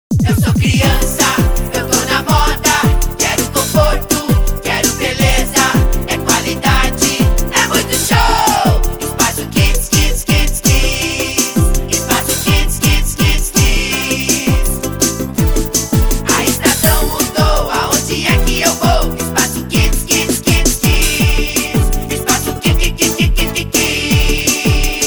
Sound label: Jingle; Espa o Kids